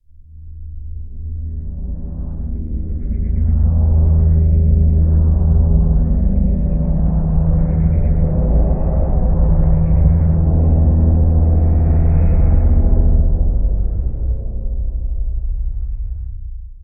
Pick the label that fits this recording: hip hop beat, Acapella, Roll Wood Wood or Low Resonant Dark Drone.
Low Resonant Dark Drone